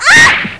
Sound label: pain; quake2; hottie